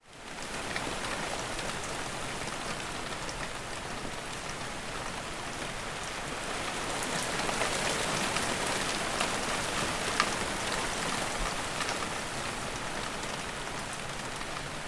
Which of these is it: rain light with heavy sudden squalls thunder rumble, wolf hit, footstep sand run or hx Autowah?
rain light with heavy sudden squalls thunder rumble